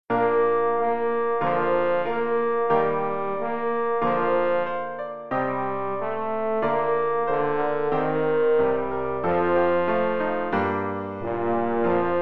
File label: tromb tenor